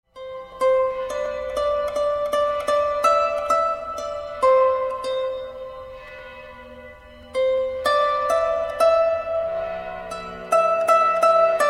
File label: cythara